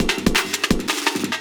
LOOP, 170 BPM, Drum & Bass

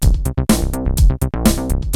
EURO-DANCE; Rubber Chicken Software Sample Bank

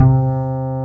W BASS C3

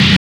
SH BURST, Drums; Distored Reality 1&2

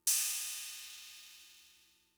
Cymbal, Fricke MFB-522, Drum Machines

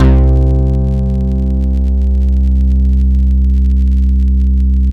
SYN BASS

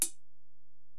HiHat, Alesis DM-PRO

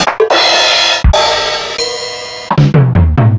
Casio CZ-230S

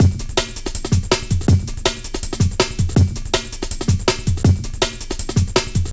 162, Jungle Breaks; Jungle Warfare 1&2

